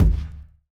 kick

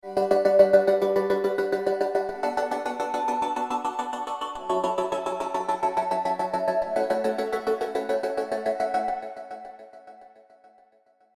serum pads; chiqui audio

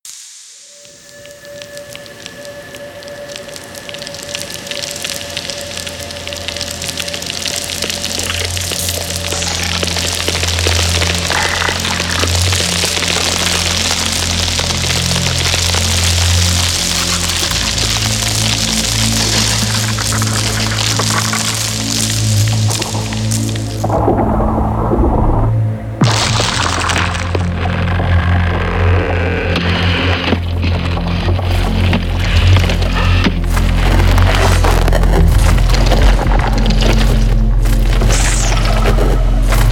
designed ice

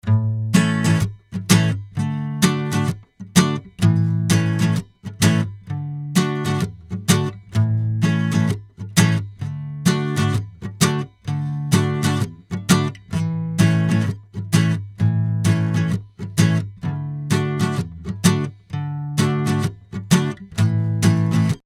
acustic guitar

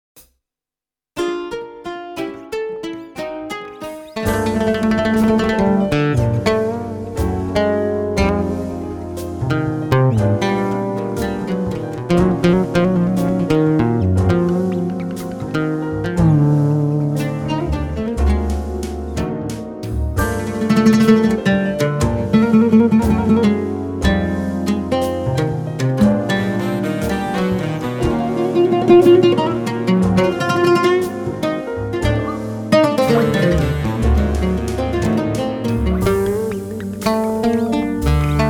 jazz ballad

